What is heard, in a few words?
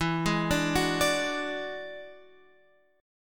E 9th E down Guitar Open F; E9 arpeggiated; JGuitar